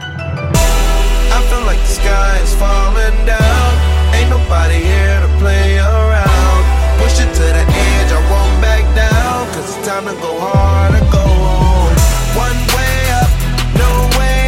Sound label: Ringtone; LG